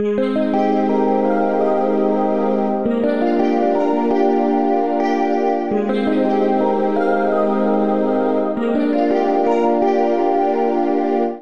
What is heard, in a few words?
Eb Major 84 bpm; Accompaniment; Tonal; MIDI Clips; Subtle Lightwaves; Drive and Glow; Ableton; Factory Packs